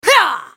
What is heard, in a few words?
ultimate effort; Sejuani; Character Voices - English